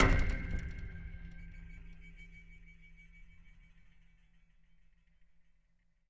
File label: Flick